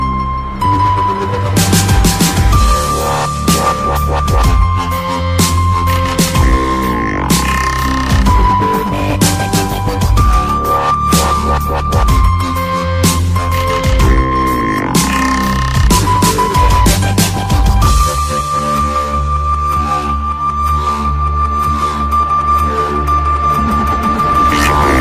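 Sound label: trance electro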